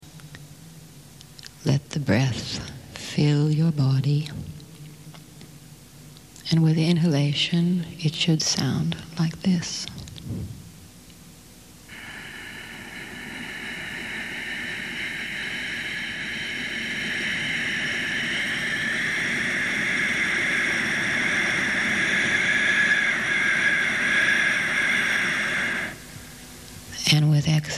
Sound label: breath